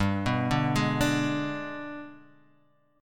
Ab Minor Major 7th Flat 5th

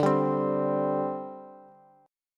D 7th Suspended 4th D Bass; strummed; JGuitar

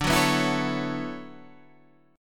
B Minor 11th D

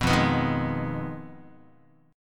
F Minor 7th Flat 5th, Guitar; strummed; JGuitar

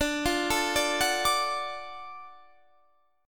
Gb Minor Major 7th Sharp 5th D, guitar

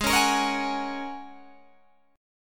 G sharp 9th Suspended 4th, Guitar, Open G; strummed; 18,18,20,18,19,18; G#9sus4

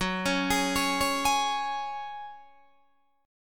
F sharp Suspended 2nd, Guitar Open D

